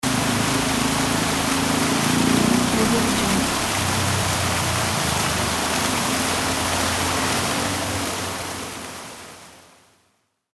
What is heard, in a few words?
city traffic with fountain ambience